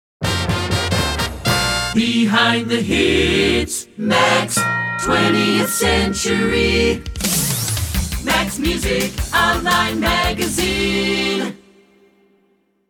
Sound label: Jingle; Short Attention Jingle Show IV